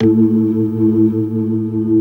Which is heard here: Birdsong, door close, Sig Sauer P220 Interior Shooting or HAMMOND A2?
HAMMOND A2